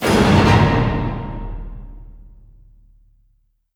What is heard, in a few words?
String Medium